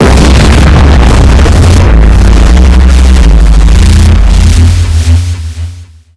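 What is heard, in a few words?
shock explosion; quake2; coop; weapons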